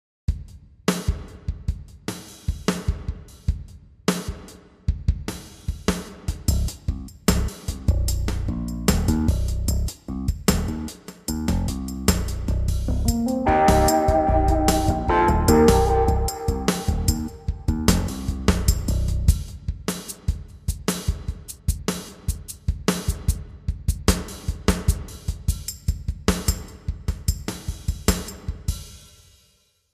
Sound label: drums